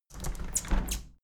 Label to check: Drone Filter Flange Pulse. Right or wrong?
Wrong